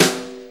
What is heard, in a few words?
Brush Snare; Brushes; One Shots; Sonic Foundry (Sony Creative Software); Loops & Samples Drum Tools